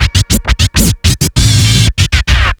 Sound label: scratch; Zero-G; Freak Beats